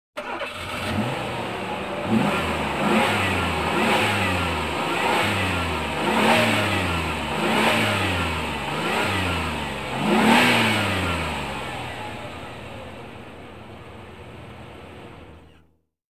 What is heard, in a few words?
Automobile Starting And Stepping On Gas; KMP Film Video Sound Effects